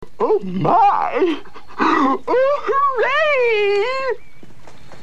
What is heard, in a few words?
Hurray; Oh, my! Oh, HURRAY!